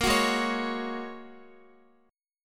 Ab 7th Suspended 2nd Suspended 4th, Guitar, 4F,4A,5C,5G,5C,6E; strummed